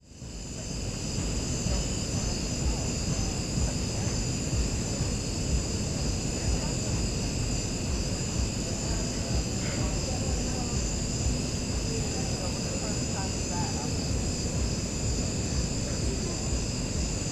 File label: Wedding Villas Night Ambience